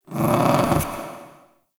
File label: Ambient Meow; Characters; Warframe; Kalymos